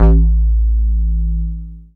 techno, BASS; Techno Trance Essentials

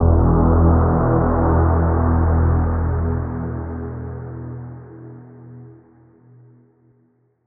synth note deep saw E; Virtual Riot; Synth Notes & Chords

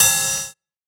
Cymbal, JUNGLE BREAKS DRUM SOUNDS

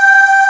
VOX, CRYSTAL SYN; AKAI S6000 CD-ROM - Volume 1; SYNTHESIZER

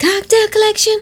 sung, Vocal Lix; Kocktail K Spoken

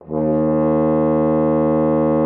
FR HORN D#2; Xsample VOL-3

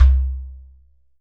GHATAM

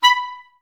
STAC B4, Orchestra; Best Service; Peter Siedlaczek's Advanced Orchestra

